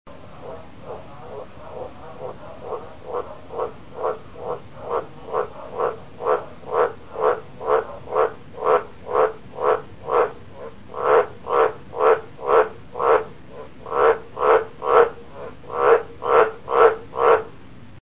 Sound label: croak